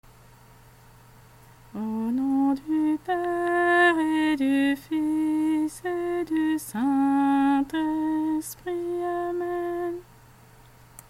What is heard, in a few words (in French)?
Bande son Signe de Croix chanté